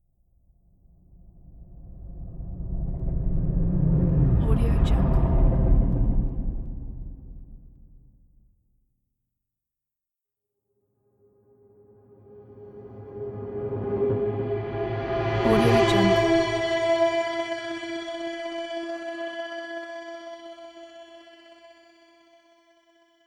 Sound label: unearthly scifi atmosphere